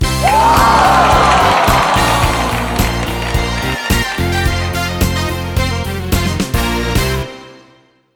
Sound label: Correct Wrong; Dinox; Wii U